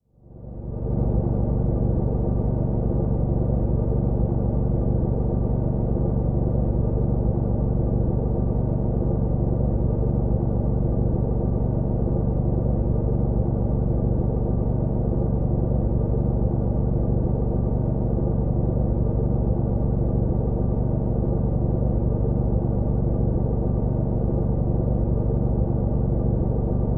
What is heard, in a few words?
White Noise for Baby Sleep